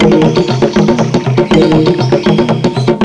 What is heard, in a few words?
TR-7 loop